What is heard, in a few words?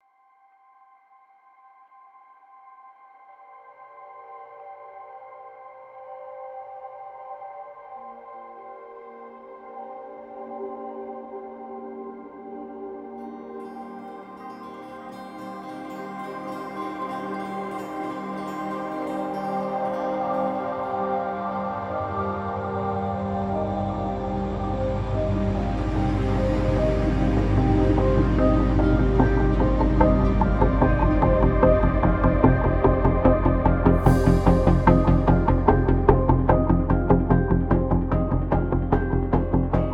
Organic, Pulsing